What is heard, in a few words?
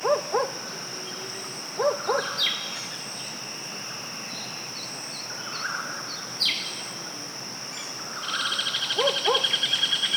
barking owl